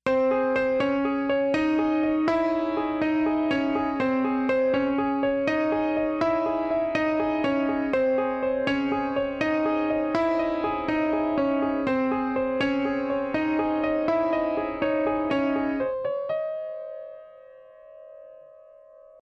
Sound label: Piano; BSP1 Discipline